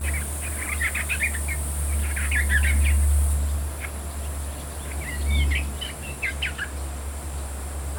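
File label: uguisu, bush warbler; ウグイス幼鳥が発する”ぐぜり”; 8秒間; 羽を震わせながら鳴くことが多い; 茂みにはウグイス幼が3羽ほどいた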